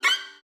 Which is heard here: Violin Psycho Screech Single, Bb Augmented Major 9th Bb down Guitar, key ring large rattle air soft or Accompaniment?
Violin Psycho Screech Single